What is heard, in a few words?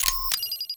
CAM CLICK, camera; RED Hydrogen One